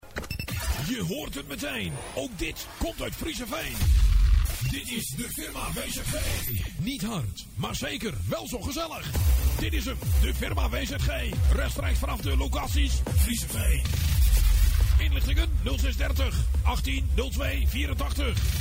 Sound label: Jingle; Firma W.Z.G.; Audio Fragmenten; Arkan Radio 2